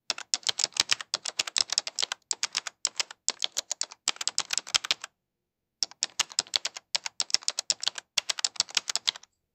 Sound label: clear realistic office ke, keyboard